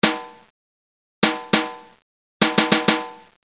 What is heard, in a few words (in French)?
caisse